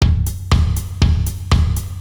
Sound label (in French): Rythme